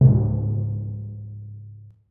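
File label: timpani p left hand c2; Percussion; Sonatina Symphonic Orchestra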